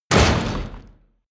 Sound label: explosion dirt metal short; explosionen; comedy-cartoon; soundpool